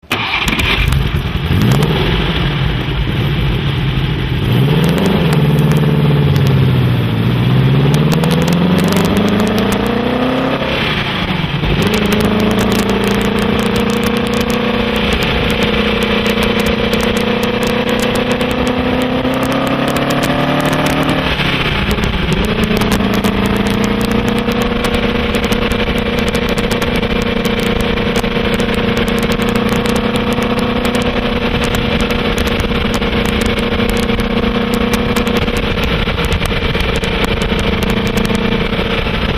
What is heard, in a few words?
300SLR, oldtimer